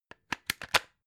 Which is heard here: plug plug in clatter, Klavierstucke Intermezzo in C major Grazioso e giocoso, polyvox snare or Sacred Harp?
plug plug in clatter